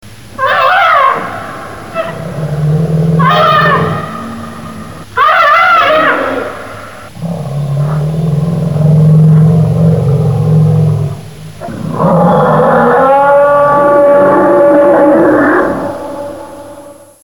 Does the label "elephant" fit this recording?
Yes